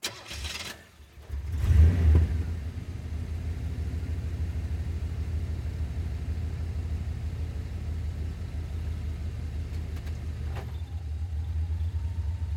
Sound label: Z28 Away Slow